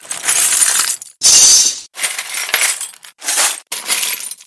tradeskill looting

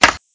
hit bow arrowless

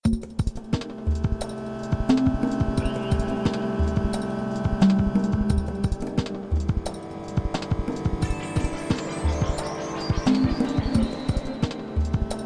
wilderness intense ocean

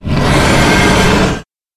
monster wyvern white

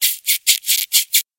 monster mouse

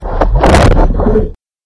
monster stingray

